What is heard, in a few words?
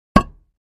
Patio Square Impact, concrete & brick